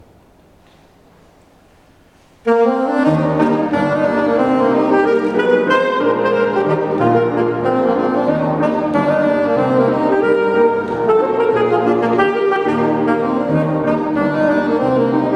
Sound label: Improvisation